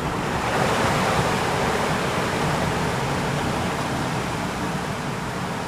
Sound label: seashore; Hearts of Iron IV Death or Dishonor